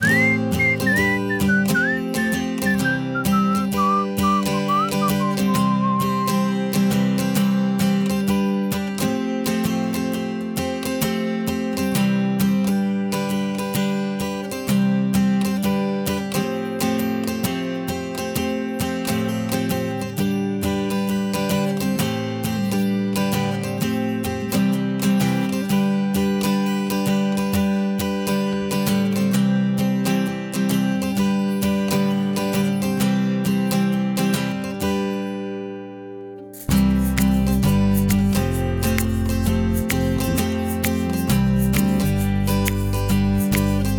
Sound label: Backing; jardim infancia